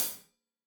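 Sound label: hat closed, hi-hat cymbals stereo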